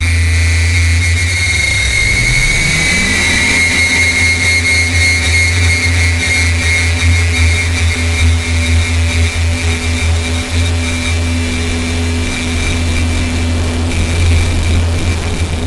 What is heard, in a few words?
cymbal stinger designed; sonniss gdc